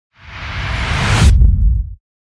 Earth Shaker Wipe Wipe; Ninja Tracks

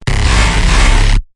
Blender Tonal; Blender 2 Tonal; Ninja Tracks

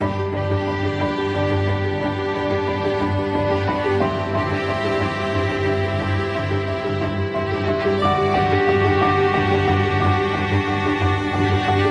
Champions Ambient

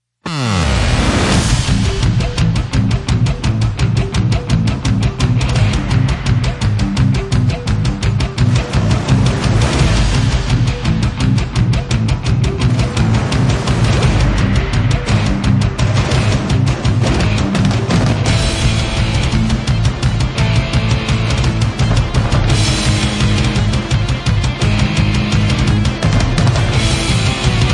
Epic Speed Metal, Band Only; Gothic Storm Music

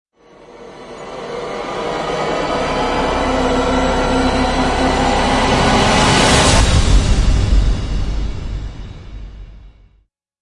Rise End Of Era; Brand X Music; Brand X Music Catalogue - Editor's Essentials

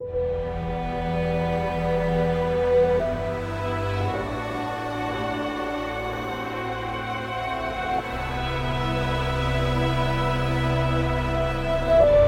Remorse Ambient

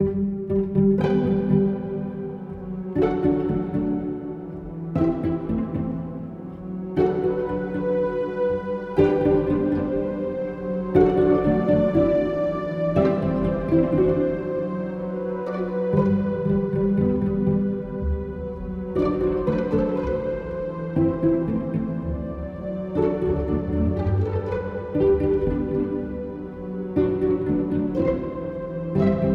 Charming Ambient

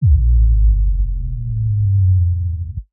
Lowdown Drop Hit Downer; Ninja Tracks

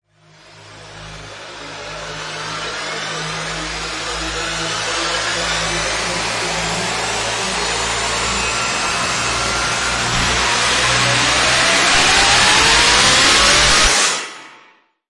Bright Rise; Ninja Tracks

